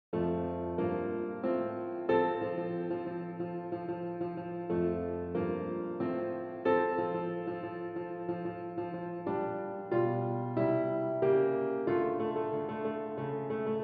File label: 2 Part Accompaniment Track